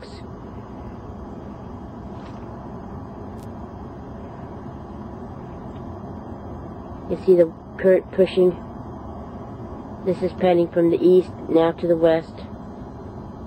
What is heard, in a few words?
jetty